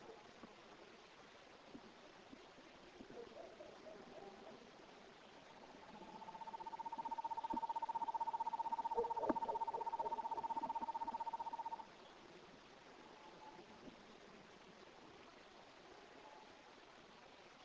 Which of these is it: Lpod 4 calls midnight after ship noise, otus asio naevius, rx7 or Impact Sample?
otus asio naevius